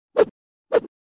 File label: Kung fu bamboo stick whoosh